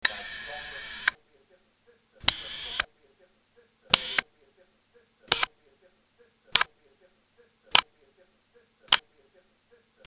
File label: shutter